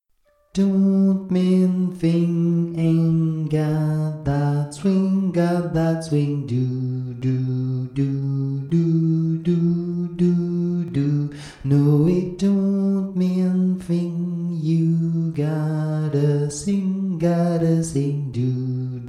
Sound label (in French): baryton voix